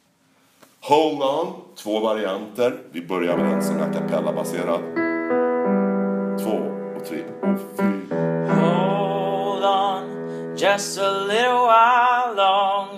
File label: Tenor; Gospel